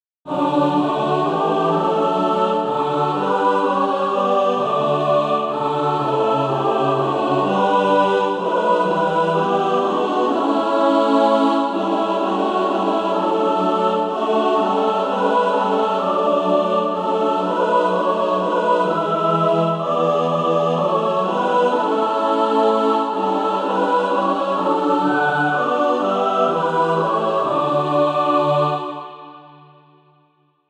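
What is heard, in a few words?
mixed, SATB Practise Music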